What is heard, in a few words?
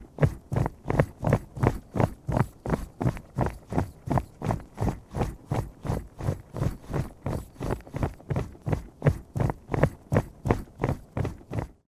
Group of adults running footsteps on solid ground